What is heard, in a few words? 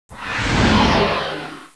air force; Babylon 5 - Entertainment Utility